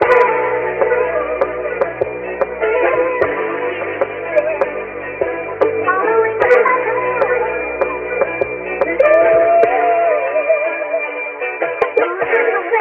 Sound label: keys and voice, phonky loops